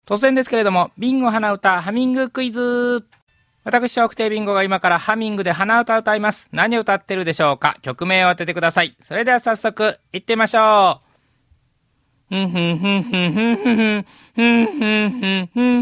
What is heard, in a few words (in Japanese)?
hanauta